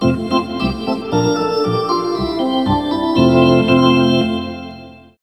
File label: Organ; NHL FaceOff 98; PS1